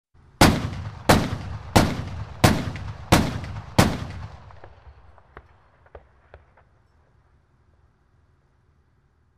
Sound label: tank; Стрельба из танковой пушки и пулемётного орудия